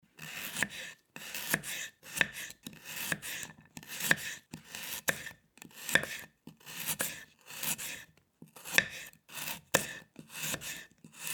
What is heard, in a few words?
cutting raw carrot